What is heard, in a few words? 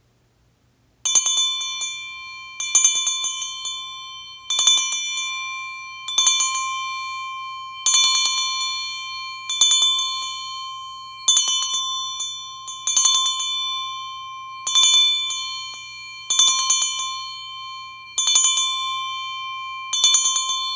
Bells